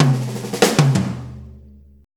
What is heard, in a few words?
90 BREAK, DRUMS